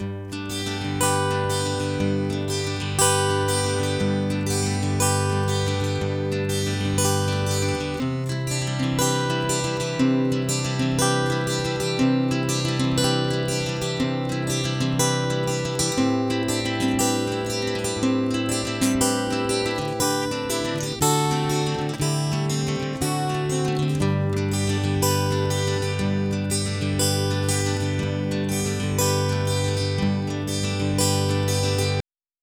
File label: piezo guitar DI with IR before